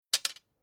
Weapon Sword Old Long Metal Hit Bounces; Late 19c; Tom Hutchings

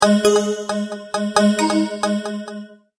Multimedia Tone-Thinking/Processing

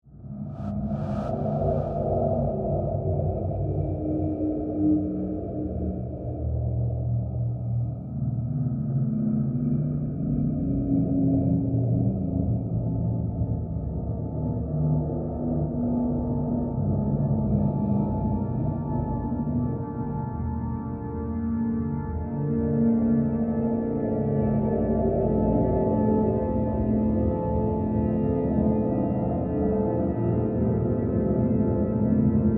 Bed Ethereal Swell, Horror, Tonal; Scary Tones; BlastWave

